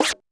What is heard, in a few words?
Mega Drums, Korg 01W